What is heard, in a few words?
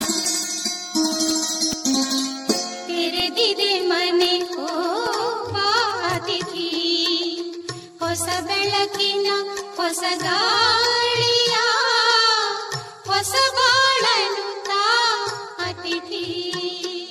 Ring Tone